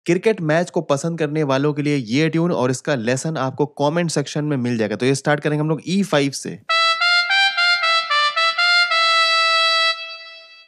ipl ringtone cricket; cricket match

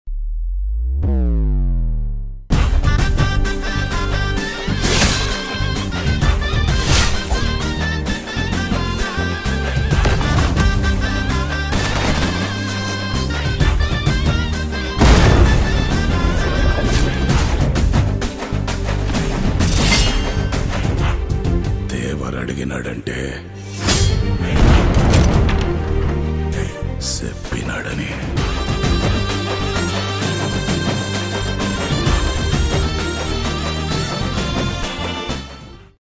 trailer bgm ringtone red sea ringtone bgm; Devara; NTR

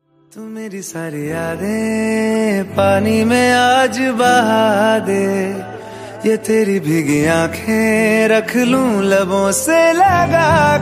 ringtone emotional bgm

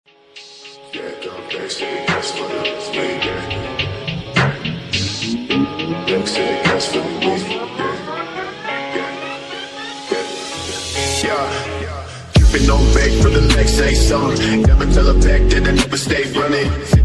ringtone beats

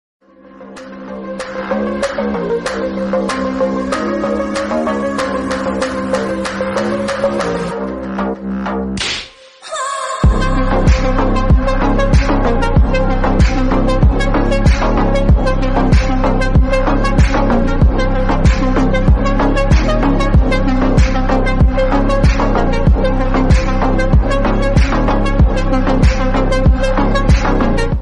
instrumental bgm ringtone

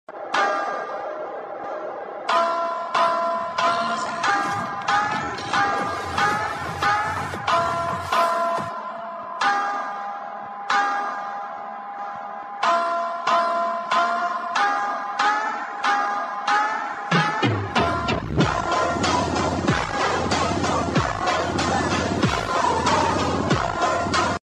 attitude ringtone english ringtone

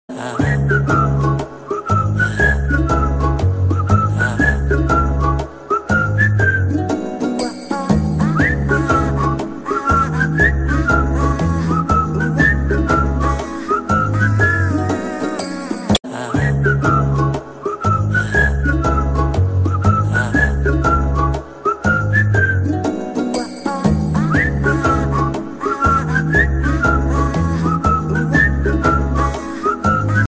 whistle theme ringtone; premam

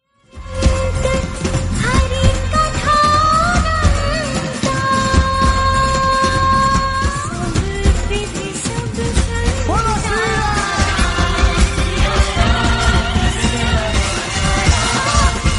female version song devotional ringtone

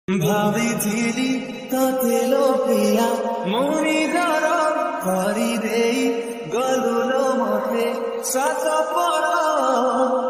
odia ringtone

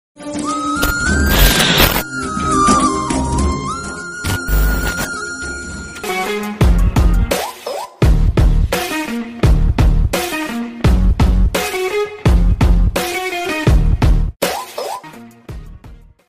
bgm remix ringtone; attitude killer ringtone; ranveer singh

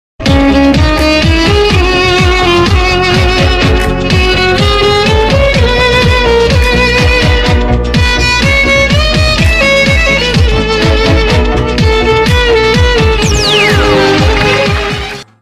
kannada ringtone love ringtone; moggina manasu

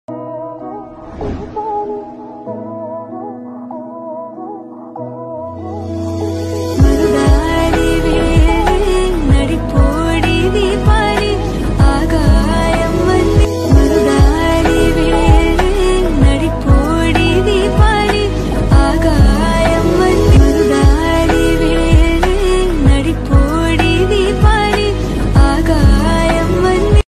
bgm song ringtone romantic love song bgm ringtone

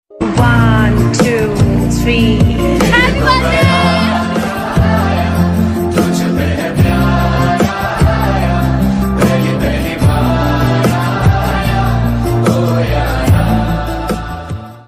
happy birthday song

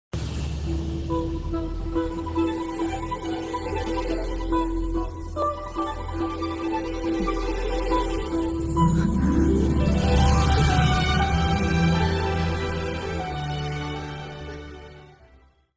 heart touching climax bgm; parugu movie; prakash raj

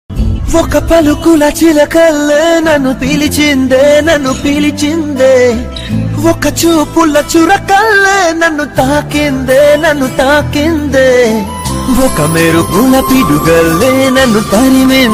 telugu music love

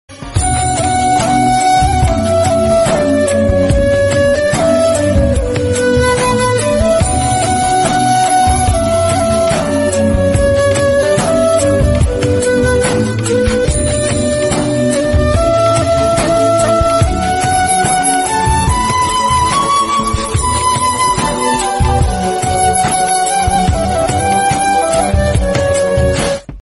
romantic ringtone, flute ringtone

